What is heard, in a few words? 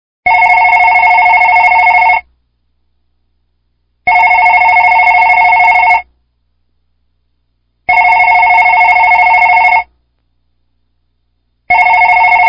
Phone Ringing